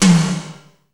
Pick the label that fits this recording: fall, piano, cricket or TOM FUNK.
TOM FUNK